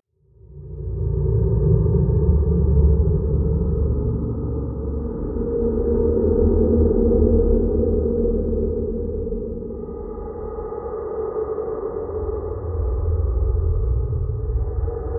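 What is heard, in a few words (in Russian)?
хоррор логово зверя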